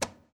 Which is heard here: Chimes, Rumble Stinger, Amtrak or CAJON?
CAJON